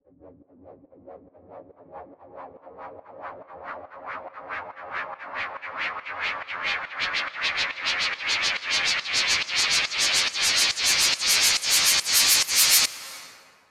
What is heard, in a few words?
White Noise Up; Dubstep; FX; Cymatics; Dubstep Starter Pack